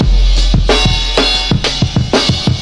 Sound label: 91 huge grooving, drum loops and breaks; 091 to 100 bpm